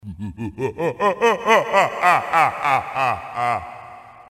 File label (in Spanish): jajaja